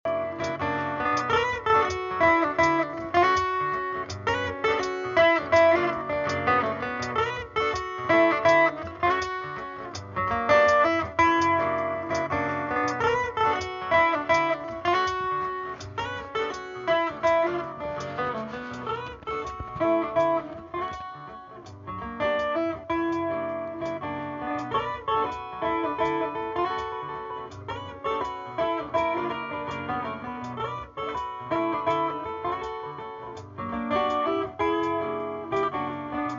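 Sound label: guitar solo with harmony; Guitar solo melody + harmony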